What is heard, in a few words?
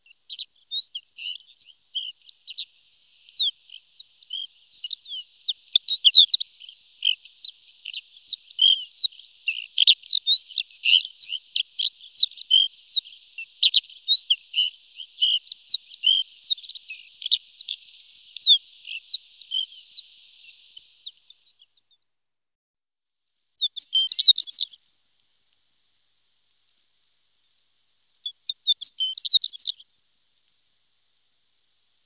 shore lark